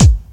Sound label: clear kick drum sound g sharp key